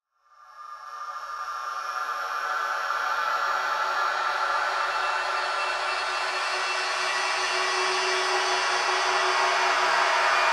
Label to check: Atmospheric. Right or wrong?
Right